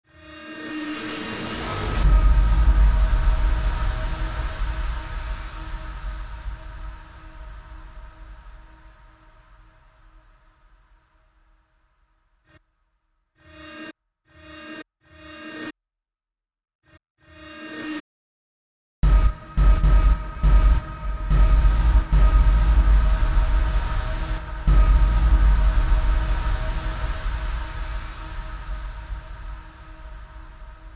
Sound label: RISE HIT